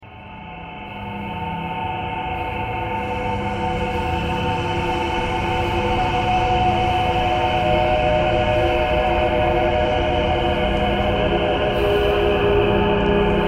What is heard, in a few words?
thread noise